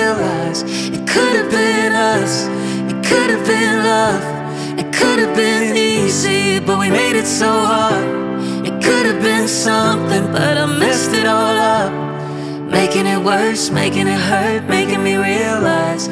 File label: Acoustic